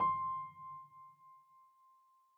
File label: piano sampler